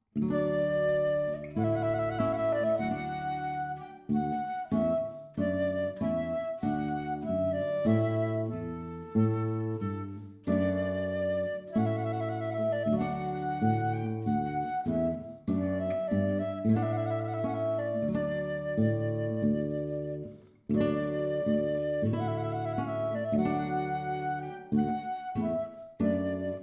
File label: Trumpet Voluntary